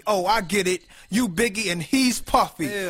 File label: acapella